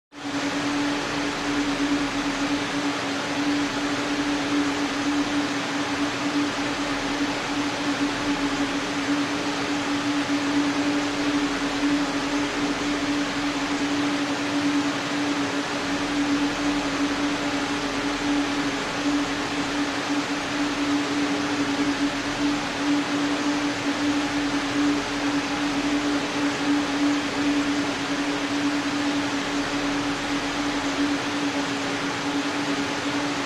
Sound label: GPU mining noise